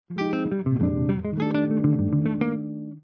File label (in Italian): Arpeggi Irregolari